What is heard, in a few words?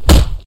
kick; CosmicRage